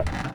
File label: plank walk